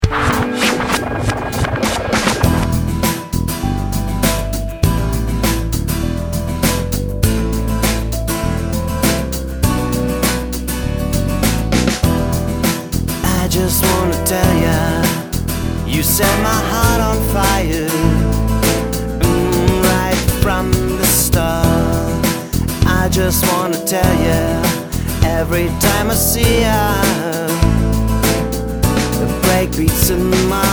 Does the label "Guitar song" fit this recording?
Yes